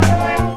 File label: HIT, Hits & Brass